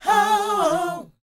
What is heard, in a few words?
SHOO BE, Vocal R&B Dance